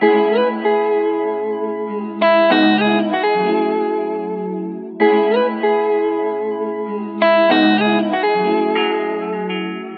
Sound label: guitar spiritual, Loops, Phonky